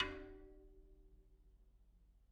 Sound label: Bicycle Spoke